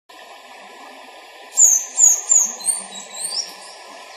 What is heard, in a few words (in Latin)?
Pyrrhocoma ruficeps; PP Urugua-i